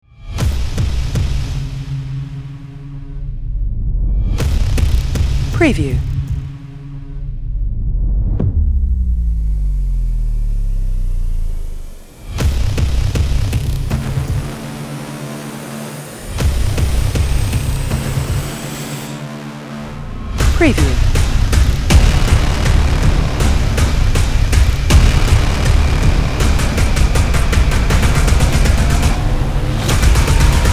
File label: Hybrid Tension Buildup Rising Drum Hits Braams Horns Trailer Intro